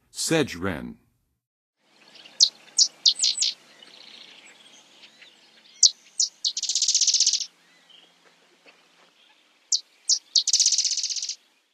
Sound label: Sedge Wren, Songs of Birds; North America; Western USA; Western USA Songbirds